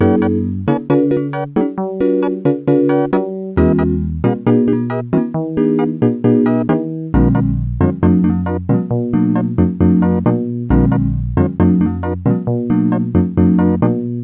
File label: pss480 loop